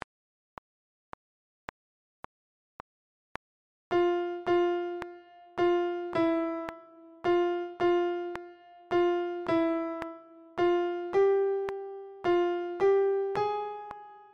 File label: Alto Piano Track; Fiddler Auditions